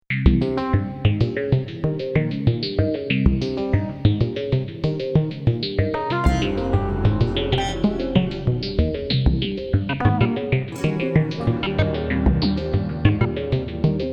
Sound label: Weird Jazz